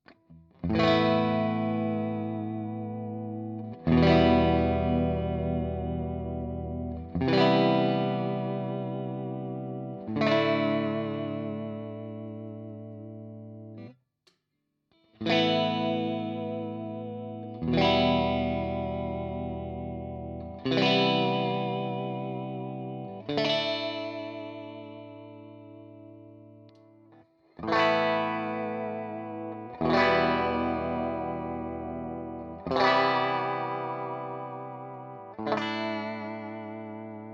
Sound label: Clean